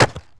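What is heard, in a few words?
land concrete, terrains; Tony Hawk's Project 8; XBOX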